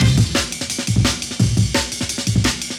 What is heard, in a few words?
Amen, m8 breaks